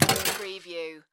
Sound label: Pinball sound, launch with slide